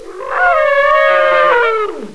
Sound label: wyvern